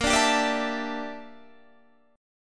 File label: G Minor 6th Add 9th A sharp